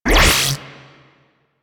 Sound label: Laser Rifle Stop; Crp Laser Rifle